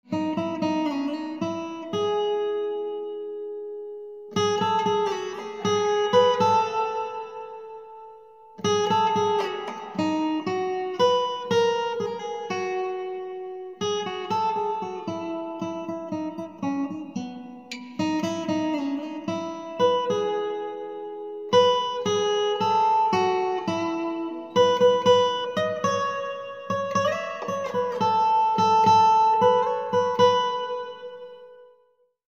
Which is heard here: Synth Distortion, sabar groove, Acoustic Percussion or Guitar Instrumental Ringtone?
Guitar Instrumental Ringtone